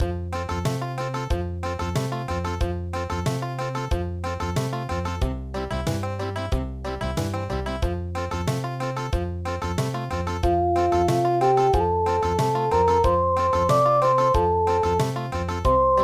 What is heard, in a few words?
Long Long Ago country